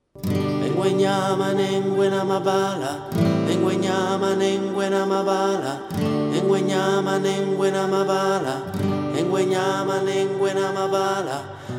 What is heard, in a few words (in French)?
Choeurs